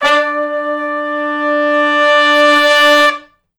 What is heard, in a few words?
Long Note w Swell (D) unison, Horncraft for R&B; Sections